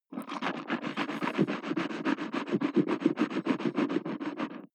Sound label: mouth rinse water